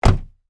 blokirovka avtomobilnoj dveri

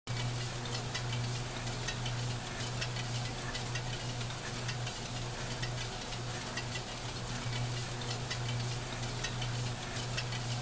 shum komnati kondicionera mehanicheskie zvuki

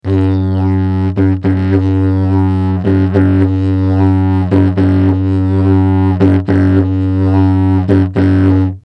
didzherido melodiya ritmichnaya

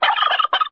indeika blizkii skripuchii chetkii

